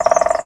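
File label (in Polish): dzięcioł, ptaki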